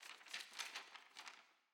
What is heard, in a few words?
witch lodge book